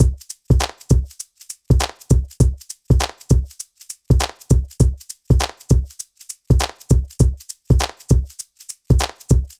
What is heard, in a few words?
drum loop 100 bpm swung; drum loops; bespoke - Floral v.1